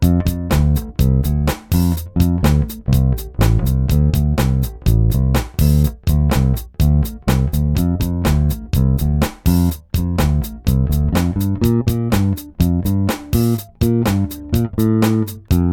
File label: vigier arpege iii active; Arpege III 6 (active, Glockenklang)